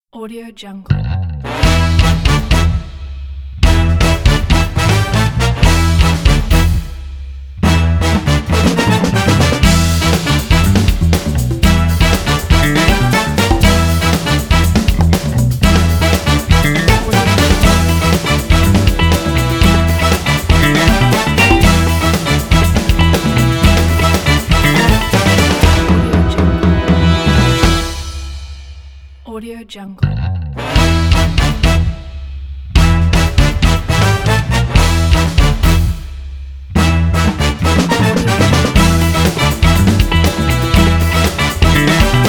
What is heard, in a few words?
spy detective opener ident